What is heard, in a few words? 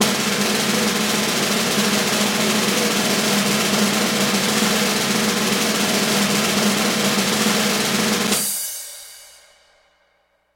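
Loop Snare Roll Cymbal; 9 sec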